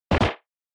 RPG Door; SNES

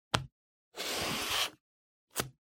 Glue Stick Swipe

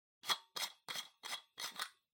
Pendant Light Bulb Remove

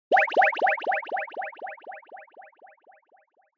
Motion Pop

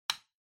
Light Switch Box Rocker Dry Mid

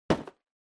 Balloon Catch

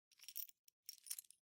Time Recorder Keys Noise

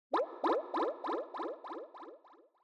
Scene Fantasize Slow Short

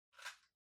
Match (Far-Shake)

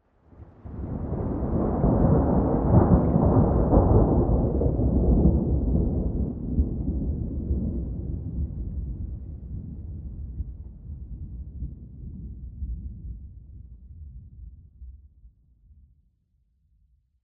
Thunder Real Ambi

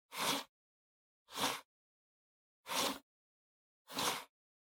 PC Mouse Move Wood Mid

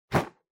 Sensu Open

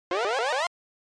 SNES RPG Magic

